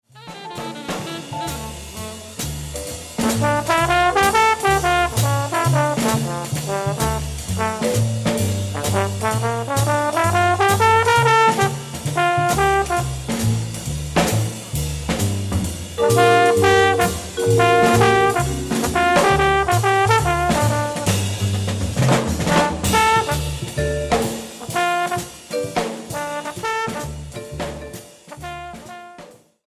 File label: trombone solo